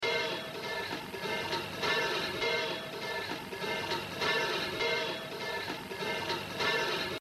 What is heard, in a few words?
Jazz Drumming